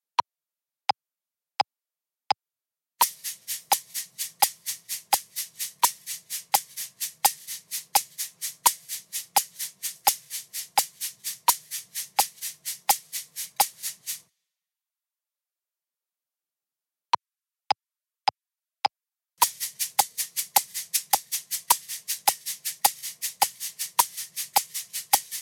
Accenti in 12 8; shakers

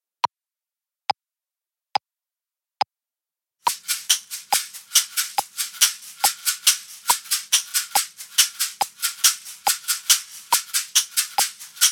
Con due Caxixi Differenti; shakers

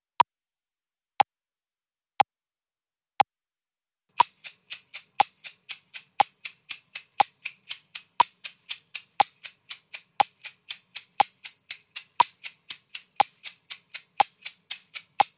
Sedicesimi con accenti, shakers

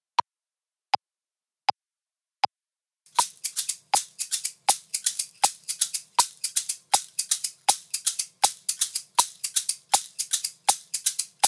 Con due shakers

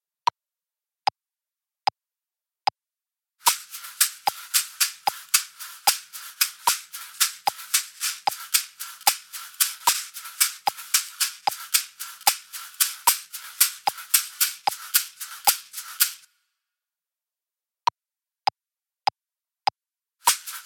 Con due Caxixi Uguali; shakers

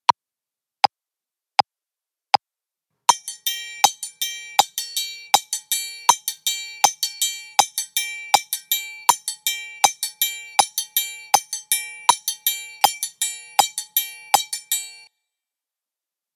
triangolo

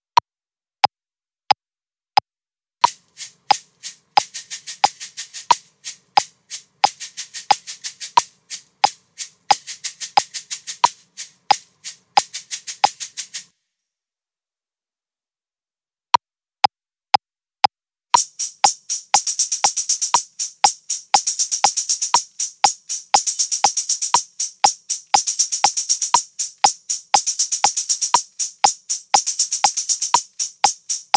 Combinazioni di Ottavi e Sedicesimi; shakers

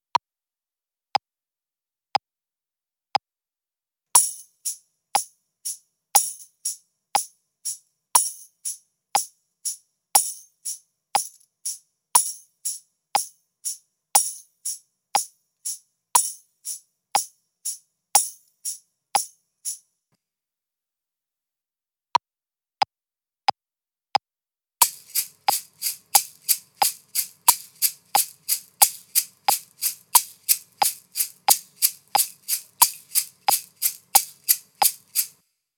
Ottavi con Accenti, shakers

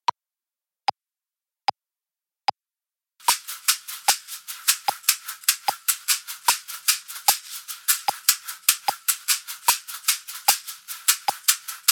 Con due Caxixi Uguali; shakers

